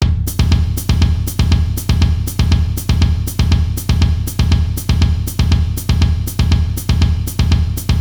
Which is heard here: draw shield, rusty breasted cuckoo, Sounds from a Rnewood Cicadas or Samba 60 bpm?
Samba 60 bpm